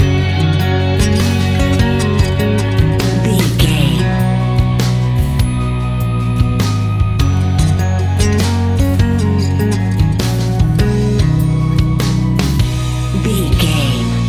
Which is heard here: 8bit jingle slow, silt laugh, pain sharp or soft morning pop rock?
soft morning pop rock